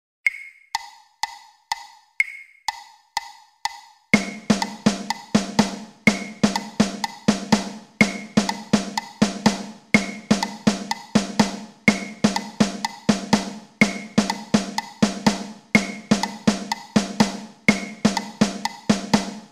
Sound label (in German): Rhythmus Party Dance Mix mit Metronom 124 bpm; Originaltempo